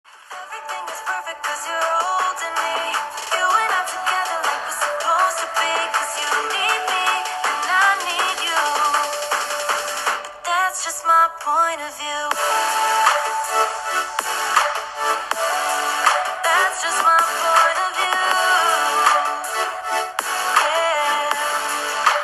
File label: OPPO A54 Speaker